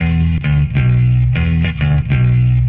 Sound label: bass angry dads; Loops; Phonky